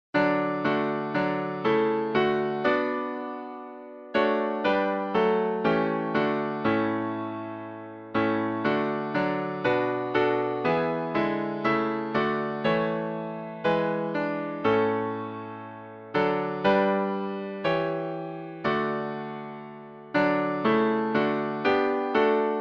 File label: Hymns